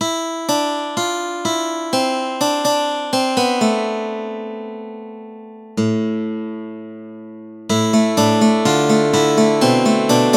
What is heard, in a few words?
steel string guitar